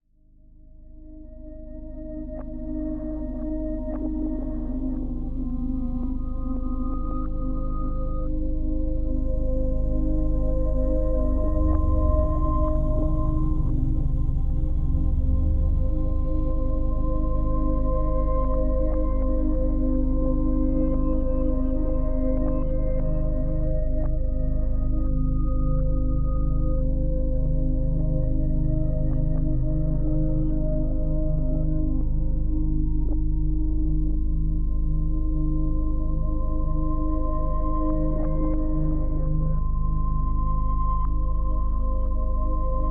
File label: music old farm inside